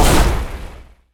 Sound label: Magic Explosion Short; David Dumais Audio; Magic Sound FX Pack 1